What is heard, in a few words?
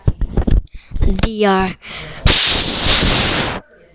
deer